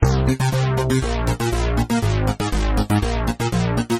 Keytar; saturday morning rpg switch gamerip 2018

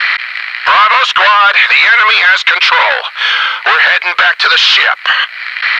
noice; Shattered Horizon

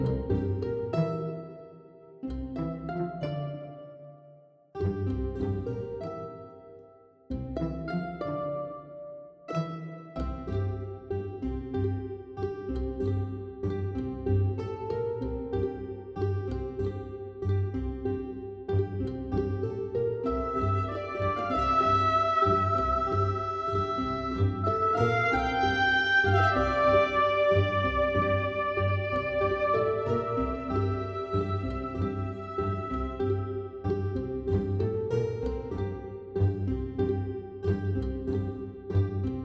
Pond Music Loop; bramble the mountain king; ps4 ps5 switch windows xbox one xbox series xs; Baby Gnome

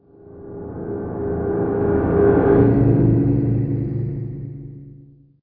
Ambient; Unreal Development Kit

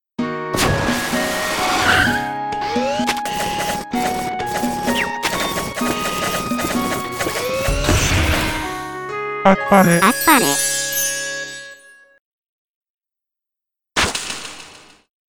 success; mojib ribbon

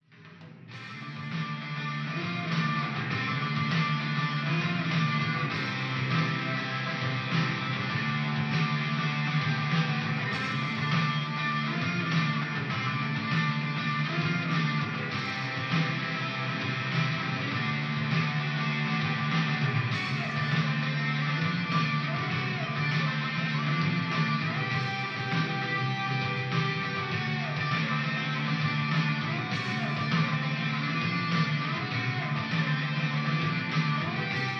Rock

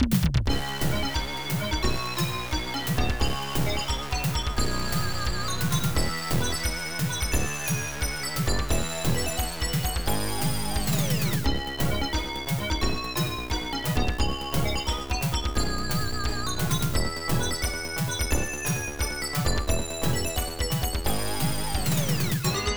Bonus Level BGM; Cybersphere; MS-DOS

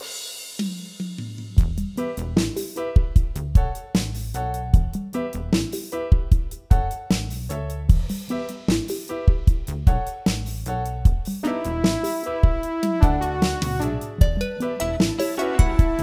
Social Hub Ambient Low; Plants vs. Zombies Battle for Neighborville

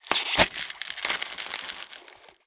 frozen; Scrat

